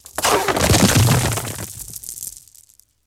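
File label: Earthquake Short Rock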